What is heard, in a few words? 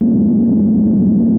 Nord Lead 4 sine FM in noise